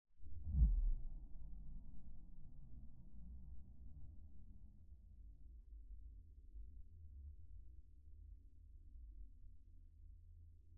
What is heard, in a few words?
crunch woomph